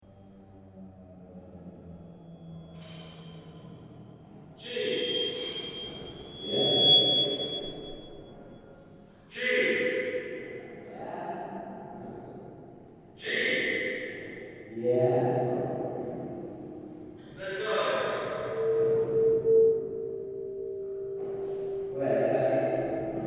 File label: Whistle Jam, Oi; excerpt